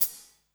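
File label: closed hi hat, 150 BPM; Drum & Bass; Ueberschall; Drum & Bass Resonance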